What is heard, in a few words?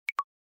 power disconnected; Coolpad; ESurfing-No1-2021